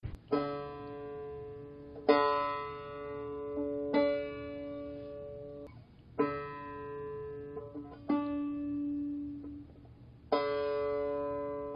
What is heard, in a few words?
tuning